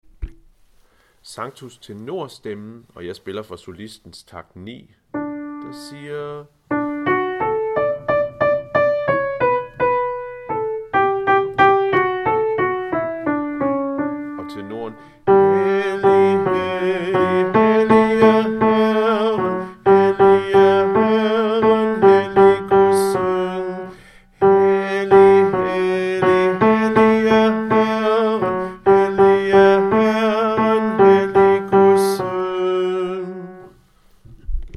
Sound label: tenor; Sanctus; harlev koret